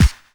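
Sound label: snare drum bass drum, Dance Mega Drums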